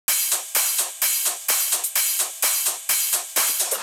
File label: Drum Loop 128BPM Perc; Loop Elements; VEC5; Drumloops; Multi Loops